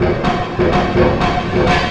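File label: drum, Breakbeat; BACKGROUNDLP; Best Service ProSamples vol.49 - FX-Area; AKAI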